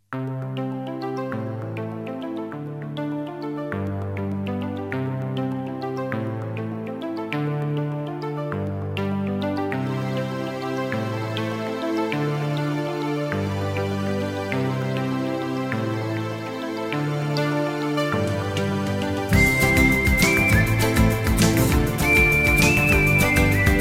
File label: ringtone; blues